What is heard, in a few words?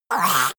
monster gurgling high; kreaturen